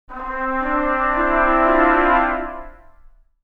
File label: Poly, brass; Cinebrass